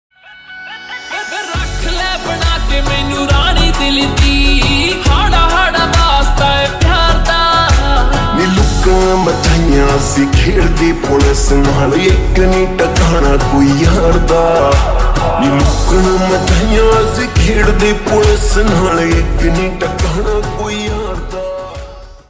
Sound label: Slowed Reverb Ringtone